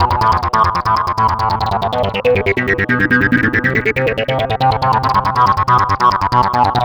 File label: NRG Arp, trance; Sonic Foundry (Sony Creative Software); Trance NRG VOL-1